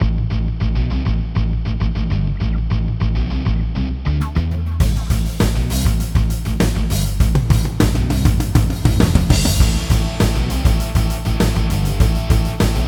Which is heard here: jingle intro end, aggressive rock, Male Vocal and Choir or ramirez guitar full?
aggressive rock